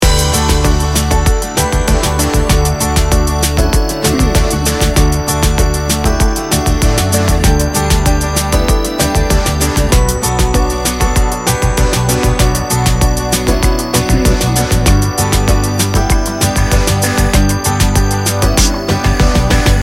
sunny and joyful light pop loop

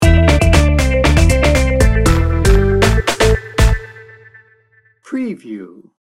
slick chick sting bumper

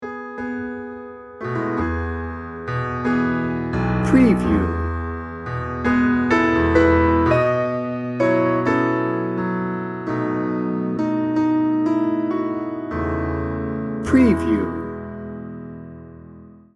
sexy tango

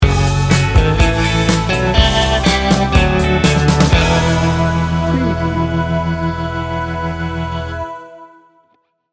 summer nights sting bumper

